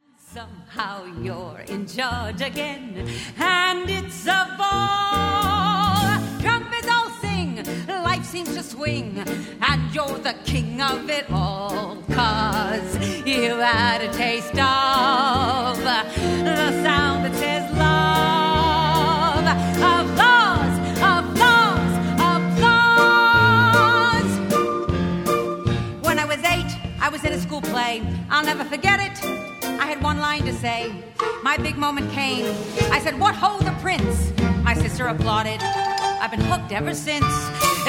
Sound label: Applause